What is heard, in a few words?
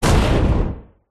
impact slam hit hard; sound design